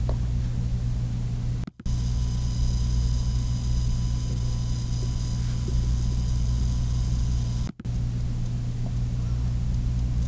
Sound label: coil whine